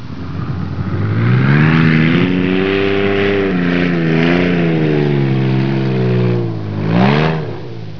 dune buggy driving

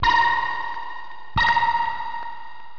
submarine ping

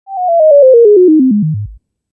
level down sound effect

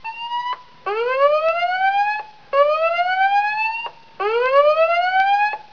alert siren

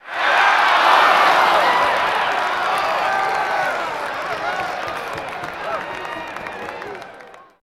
crowd cheer appl s out

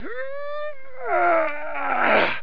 grunting

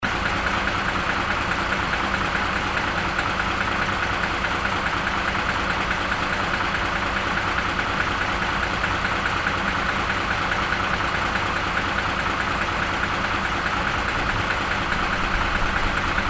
truck sitting still

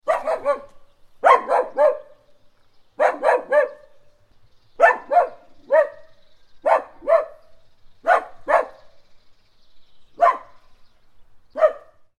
dog noise

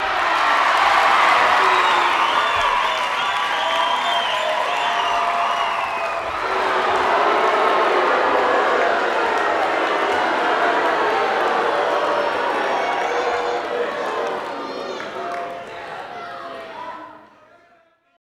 crowd cheers then boo

